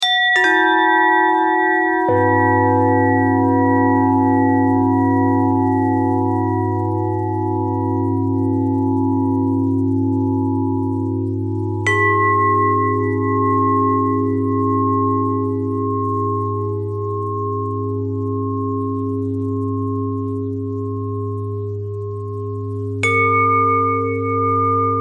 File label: bell thread